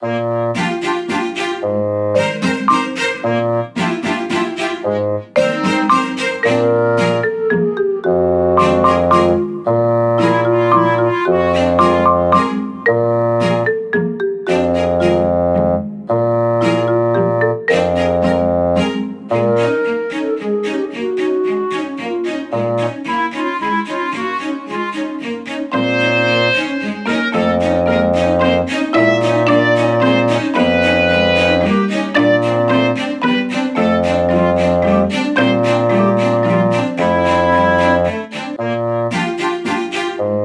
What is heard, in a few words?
BGM Fantasy; Dark Elfs Adventure